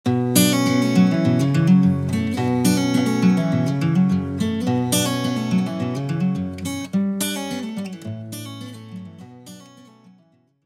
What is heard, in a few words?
acoustic guitar melody